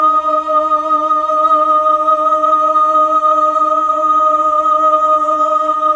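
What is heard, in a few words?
Mellotron Female Choir D sharp 4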